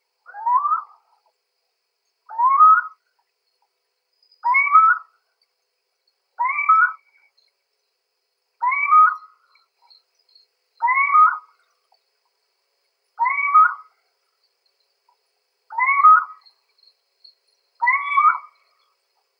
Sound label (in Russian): sich domovii athene noctua, zvuki prirodi